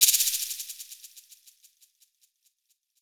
shaker FX

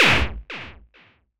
Superkick Fx

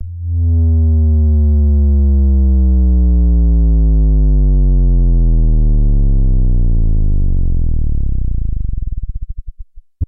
bass lead

